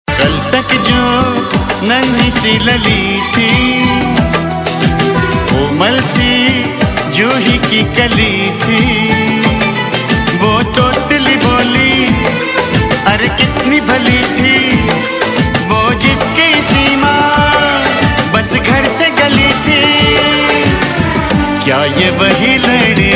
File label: Engagement Tune; Viren Jeevika